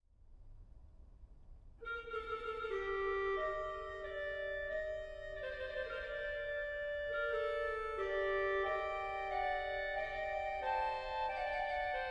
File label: Cromorne En Taille; Dandrieu; aix